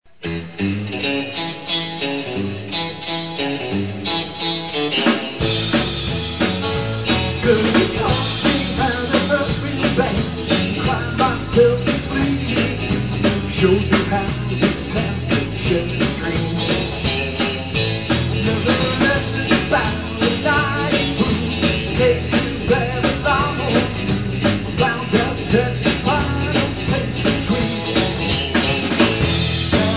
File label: Guitars